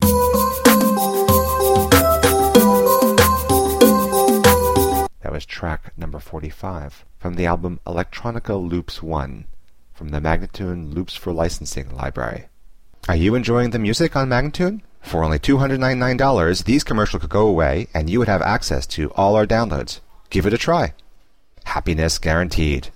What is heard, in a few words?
095 G spoken; sun palace; Electronica Loops 1